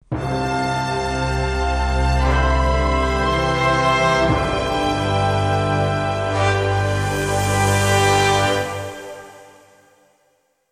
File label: Success; Mafie 1